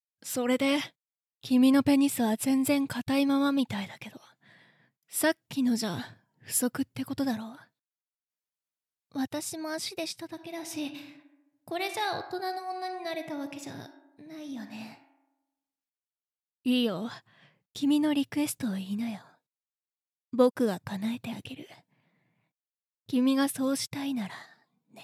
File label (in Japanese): フェラ 効果音なし; 心境あり; エフェクトあり